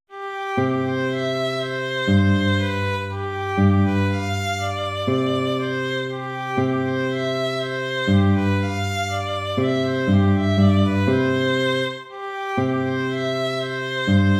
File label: waltz; Ferdinando Carulli